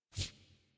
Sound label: UI Theme Tooltip; Zephyr; Warframe